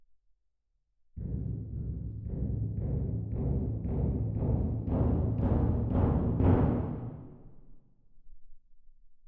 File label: Twin R Perc